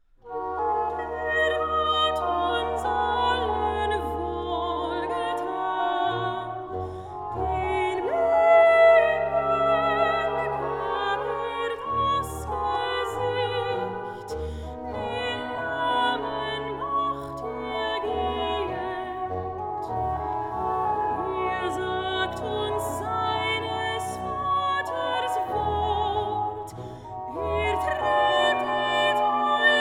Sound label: Recitativo Er hat uns allen wohlgetan